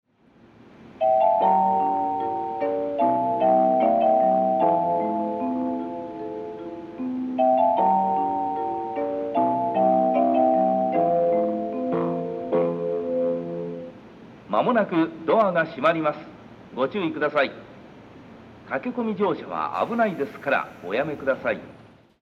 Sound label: melody